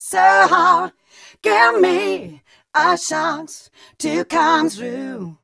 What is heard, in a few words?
f mel c, fem Vocals